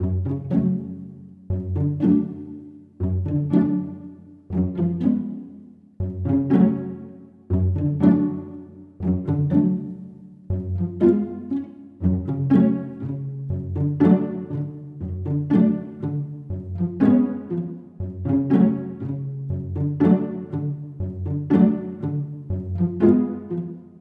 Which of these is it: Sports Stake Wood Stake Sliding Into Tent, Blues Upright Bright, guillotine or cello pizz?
cello pizz